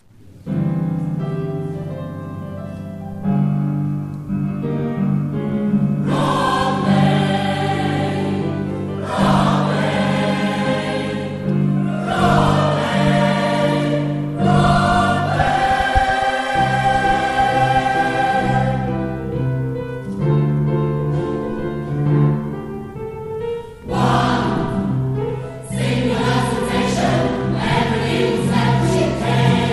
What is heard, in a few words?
Chor; Gesangfest Eschenz; Chor Tägerwilen - Chorus Line